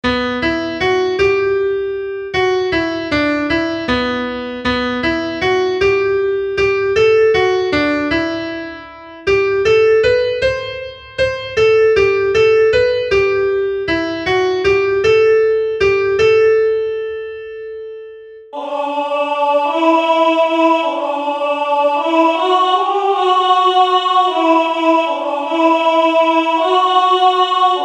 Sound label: Vigil Alto